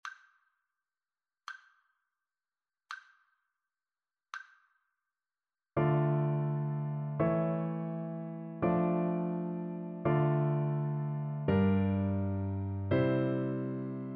harmonic dictation

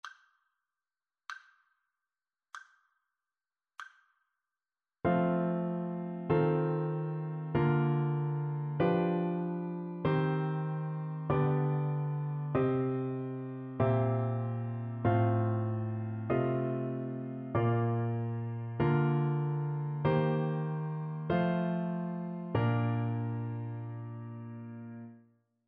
harmonic dictation; eartraining

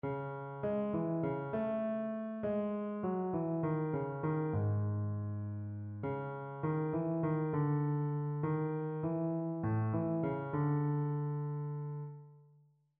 melodic error detection

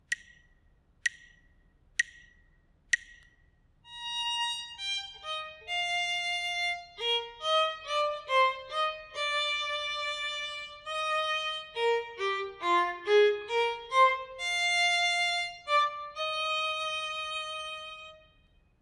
melodic error detection

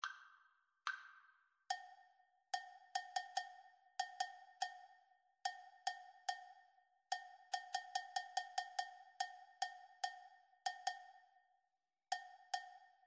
rhythmic dictation

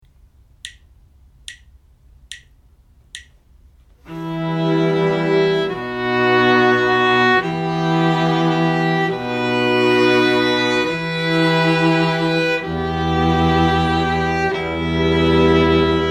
harmonic dictation; ear training

